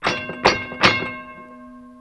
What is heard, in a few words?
musical; GRSITES Graphics